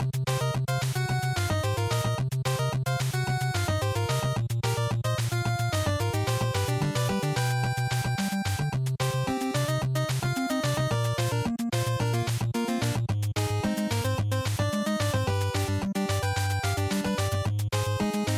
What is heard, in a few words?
Action Stage Loop, bgm